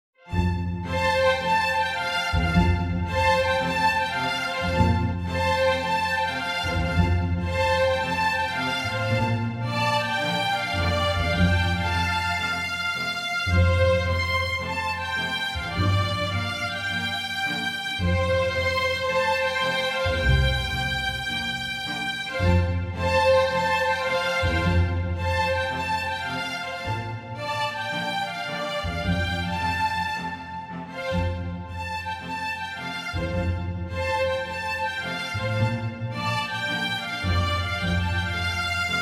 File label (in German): Die Geigen der guten Laune